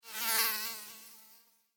Mosquito Fly By, Mosquito, Fly, By, Wings, Insect, Bug; Mosquitoes; Animals:Insects; Blastwave